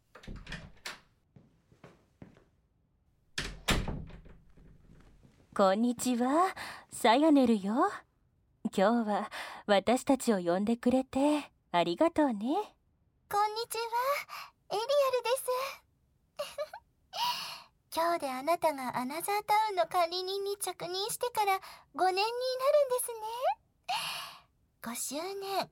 ハーレム耳舐めフェラ, ASMR; サヤネル、エリアル、マコの

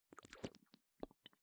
こぽっ, 効果音

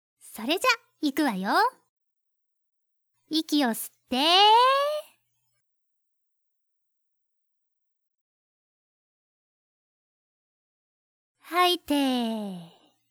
息の吸い吐きロング; ネムリちゃん

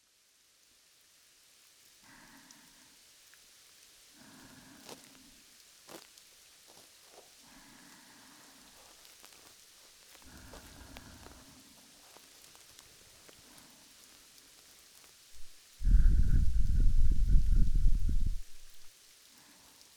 右耳耳かき

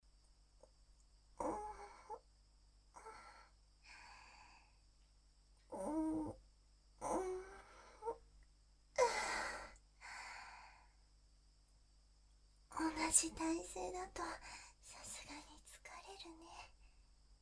甘い囁きと耳舐め 右, ASMR

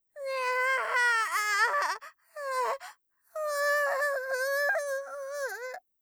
うにゃあぁ; 効果音なし

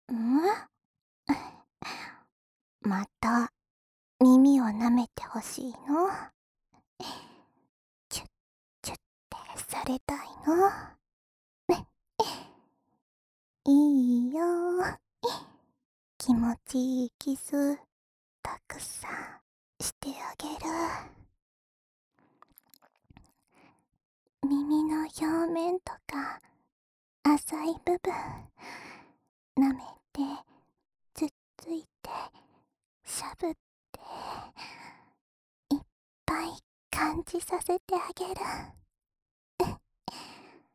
浅い耳舐めループ（両耳), ASMR; オナサポ用

